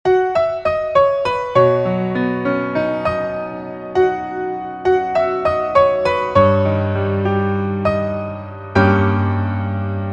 Piano ver -1키

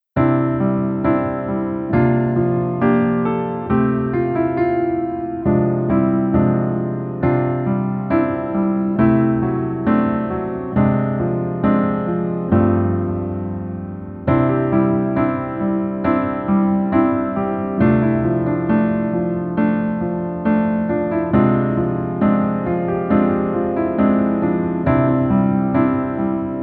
Piano ver 멜로디MR

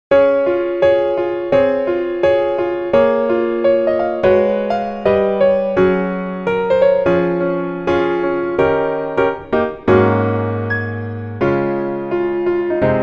Piano ver, 멜로디MR

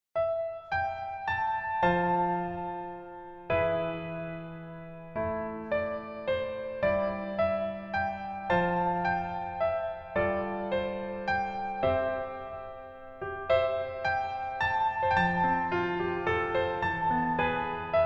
Piano ver 여자 키

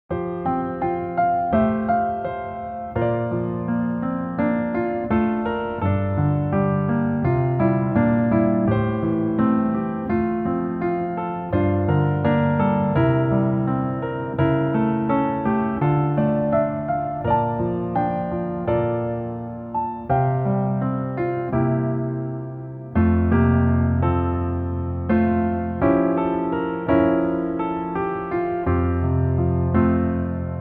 Piano ver 여자키

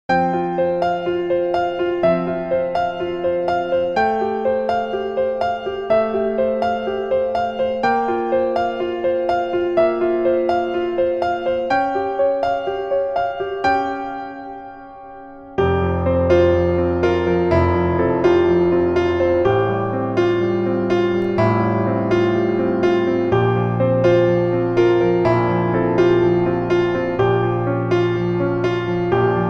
Piano ver, 멜로디MR

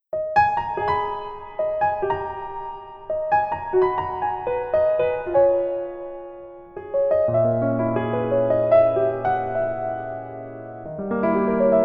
Piano ver 멜로디MR

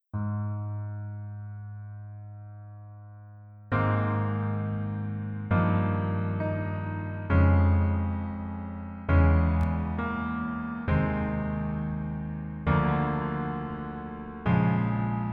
Piano ver 남자키

